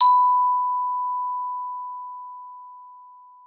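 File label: glockenspiel B3; Xsample VOL-4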